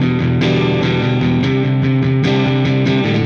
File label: Silos Dirty Strum Guitar, Indie Rock; Sonic Foundry (Sony Creative Software); Indie Rock Anthems; Session 11 - Silos